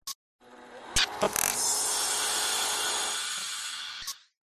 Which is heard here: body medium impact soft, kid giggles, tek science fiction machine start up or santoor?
tek science fiction machine start up